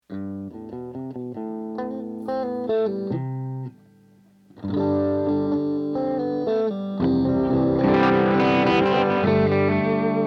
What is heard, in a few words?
Strings